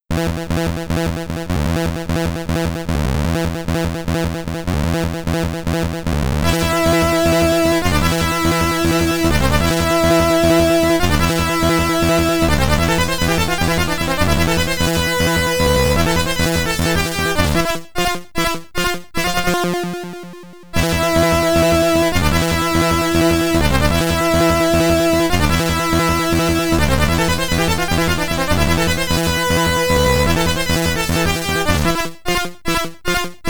The Devil Boss Carnival (SID Stereo), commodore 64; Rastan